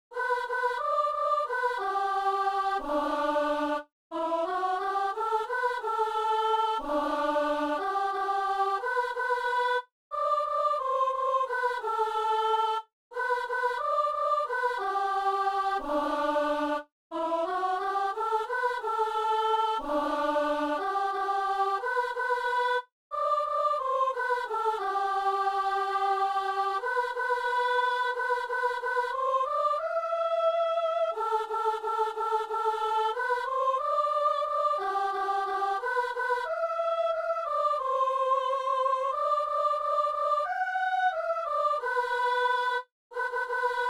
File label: soprano